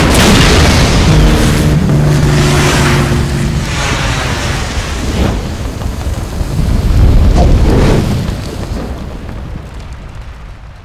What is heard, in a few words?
Plane explode mono, Explosions; Call of Duty Modern Warfare